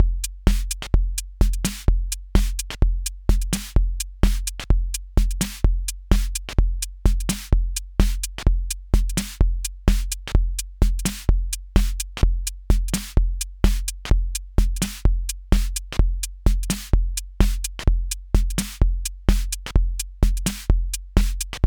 akai rhythm wolf swing

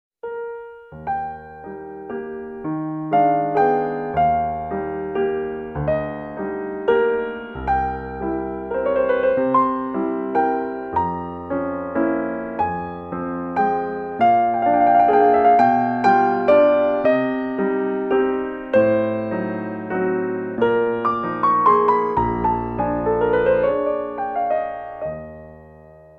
mellow piano

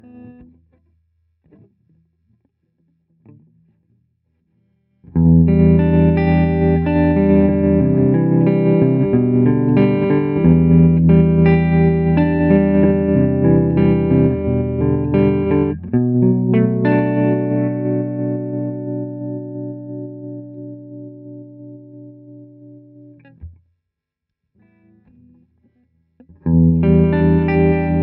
riff std harm

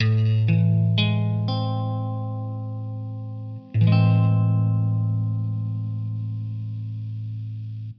a min maj7

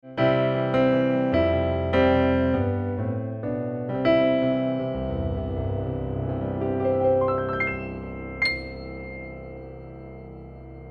Casio GP 510 Hamburg grand

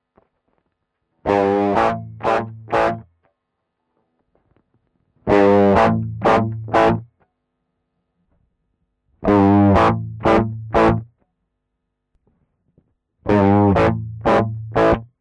ibanez ts9dx modes

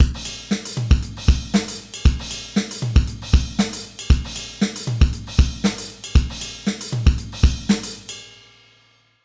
Alesis SamplePad Pro

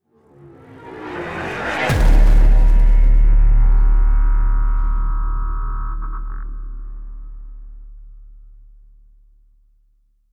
hit; heavyocity gravity

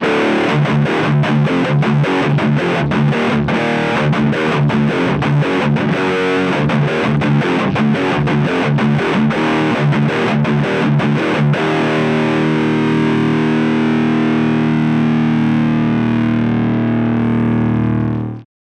Airborne Go distortion full

